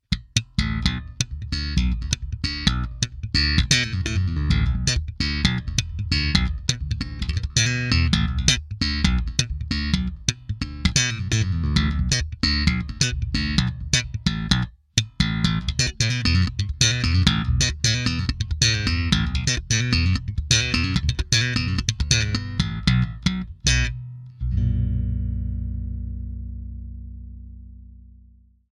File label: Slap